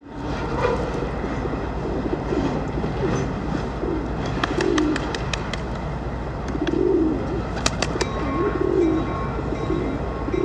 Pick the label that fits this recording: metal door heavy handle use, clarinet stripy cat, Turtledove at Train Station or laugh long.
Turtledove at Train Station